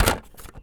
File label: Plastic Rattle; BB Retail Therapy Sample Pack